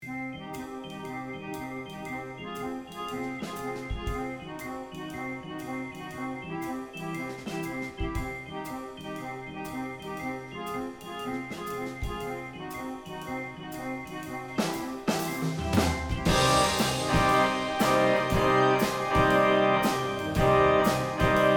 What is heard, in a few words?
Organ Plays